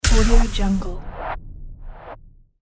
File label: impact